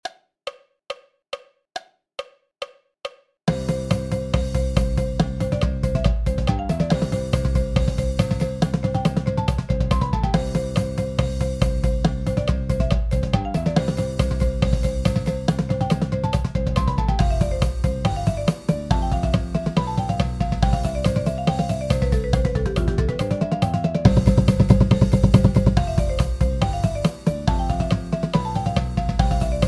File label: 140 BPM Paradiddle Breakdown with countoff; Platinum Level; Warmups; Tuscaloosa County High School